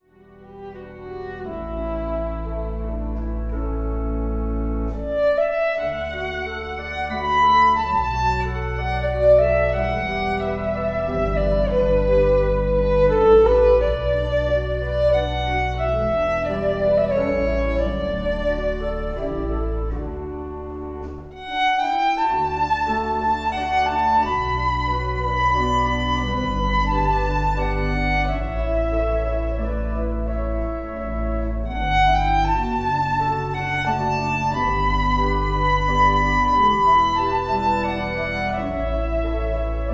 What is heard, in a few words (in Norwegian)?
tir na noir fiolin